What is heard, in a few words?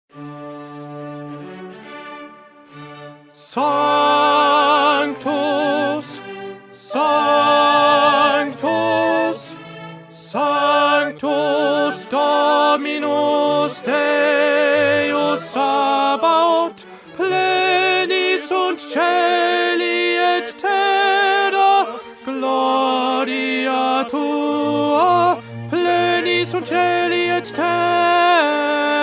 Tenor; Schubert; Mass